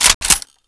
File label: m4a1 bolt pull